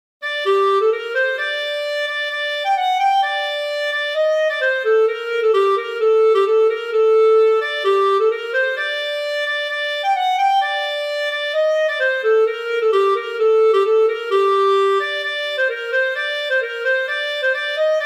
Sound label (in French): Bourrée; Bourrée d'Aurore Sand